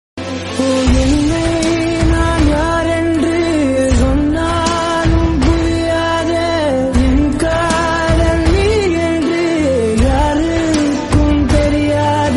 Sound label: tamil ringtone